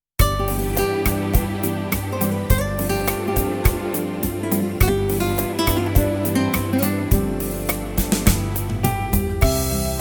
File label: rumba